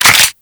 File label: Grineer Lever Action Pistol Reload; Weapons